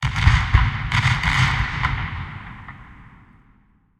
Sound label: art start fire, tanks